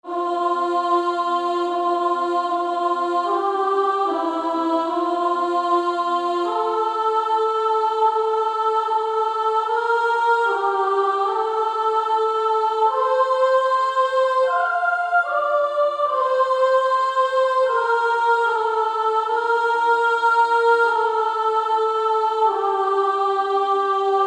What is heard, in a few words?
Female Choir 2S; Beautiful Savior